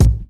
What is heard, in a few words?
sharp kick one shot a sharp key, Royality free steel kick drum sample tuned to the A# note; kick drum samples